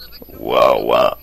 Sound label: uaua